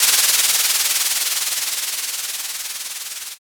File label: sparkler